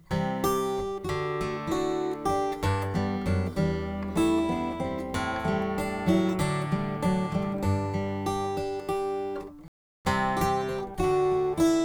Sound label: Martin D45 direct